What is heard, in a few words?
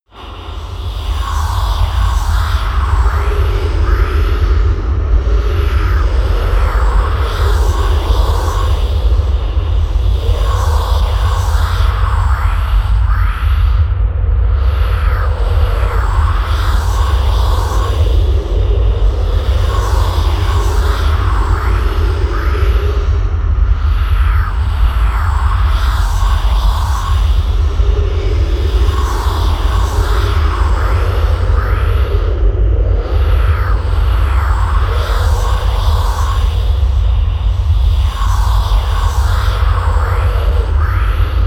atmosphere voices from hell, Horror